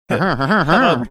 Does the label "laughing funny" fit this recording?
Yes